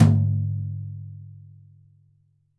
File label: DW Tom Brush, EMU PX-7 Drum Machines